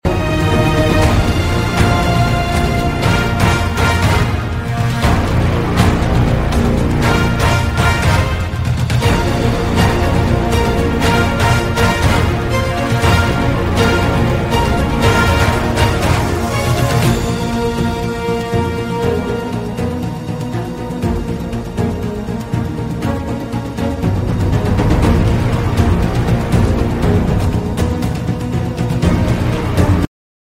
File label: New Season Music; PUBG Pc